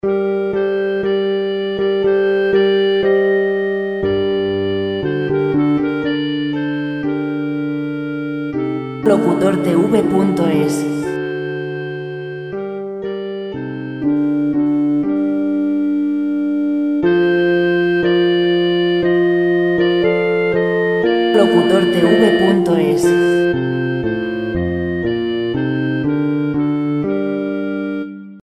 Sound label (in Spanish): 100 classic instrumentos; Alexander A. Arkhangelski; Selección de grandes temas musicales de compositores clásicos